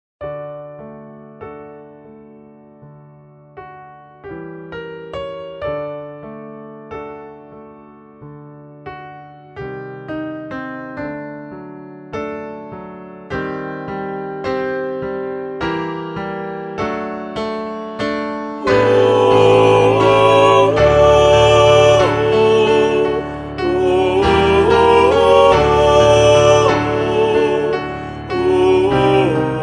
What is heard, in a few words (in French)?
TUTTI